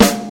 sparkly snare drum a key, Royality free snare sound tuned to the A note. Loudest frequency: 2023Hz; snare drum samples